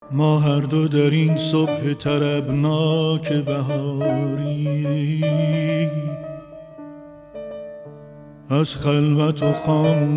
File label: Saz va Avaz Mansouri